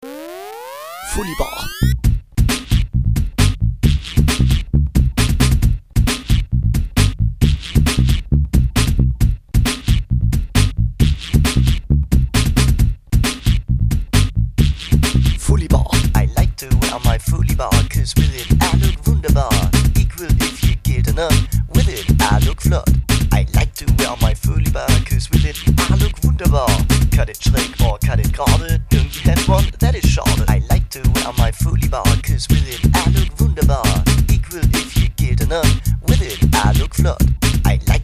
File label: rap